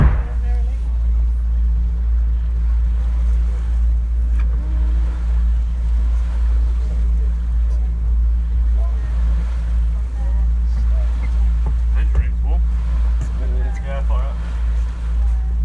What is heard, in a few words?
Ferry From Falmouth To St Mawes; Cornwall